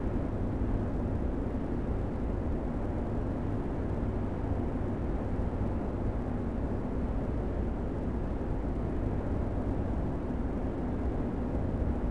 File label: mine hall ambience; Star Wars Obi Wan; XBOX